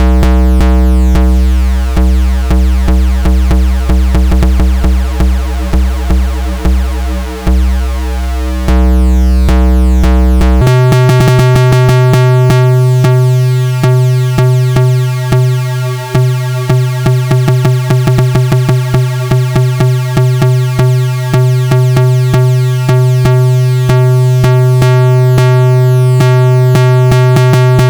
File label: hexsquare ext sync from noise ring; cyclebox